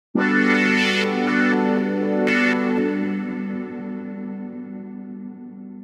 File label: Trance; jup8